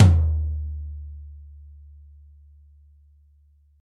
mid tom drum sample e key; tom drum samples

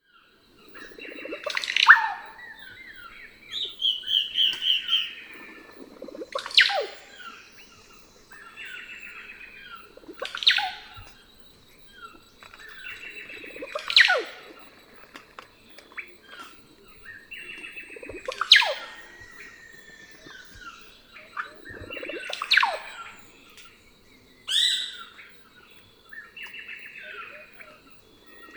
russet-backed oropendolas

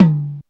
low mid tom drum one shot D key

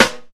rich top end snare sound c key

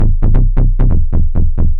133 bass mid techno, f; loops

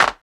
old school clap f sharp key; percussion; single hits one shots

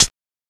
dampened hat sound A key, hi-hat

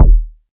fluffy bass drum single hit d sharp key

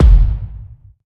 solid kickdrum sample f key; kick drum samples

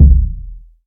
large room bass drum e key; kick drum samples

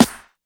boom steel snare drum sound a sharp key

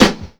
raw snare sample g sharp key; Duration 0:01; Samplerate 44100 Hz; Channels Mono

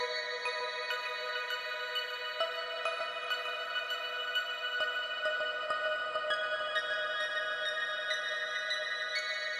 space delayed texture 100 bpm; synth loops

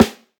Clear Snare D Key; snare drum samples

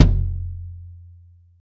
long tail reverb kickdrum e key; kick drum samples

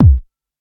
old school small reverb kick single hit c key

